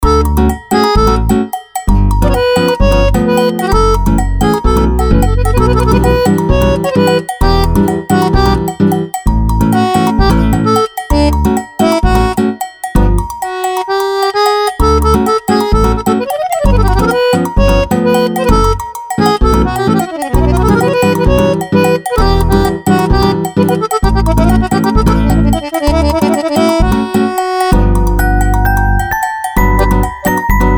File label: Blue accordions, ringtones; Oppo Find X903